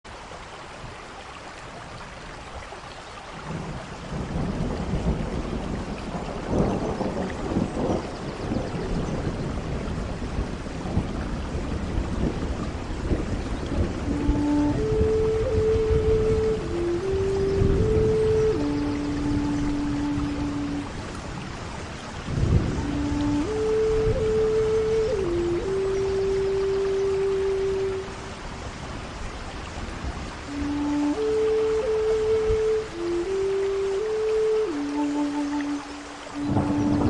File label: rain forest all day